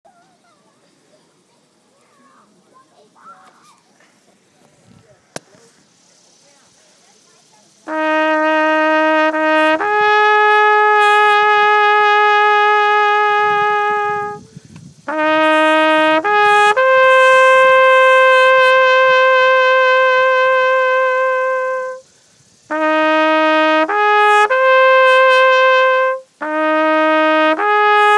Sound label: taps